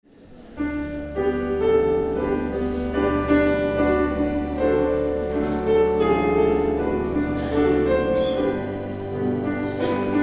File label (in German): Vorspiel